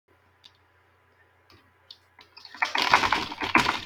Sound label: keyboard typing